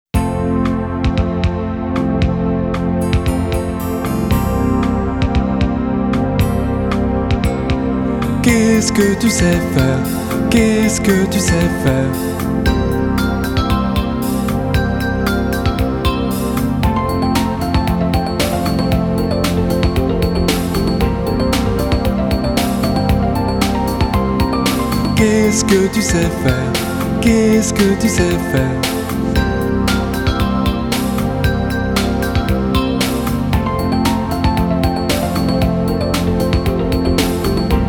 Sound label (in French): VERSION KARAOKE; Alex et Zoé 1